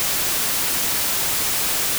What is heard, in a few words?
noise; Oculus